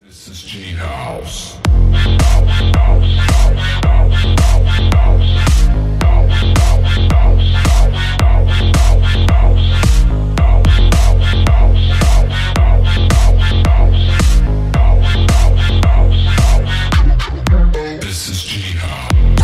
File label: G Bass; Рингтон